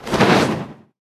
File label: Shadow dance Teleport; Hero Asra